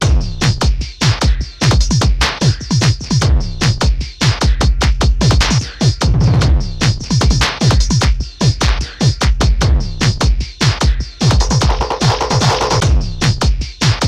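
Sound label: drum and bass; INIS; Funky Xtreams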